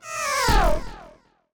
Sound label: Death Hero; Era's Time Delivery Service